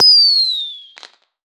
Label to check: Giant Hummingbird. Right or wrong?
Wrong